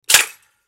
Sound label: Toaster Up, Toaster Up Mechanism Spring Latch; Foley Appliance; Household; Blastwave